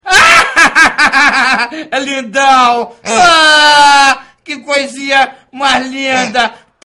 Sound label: haha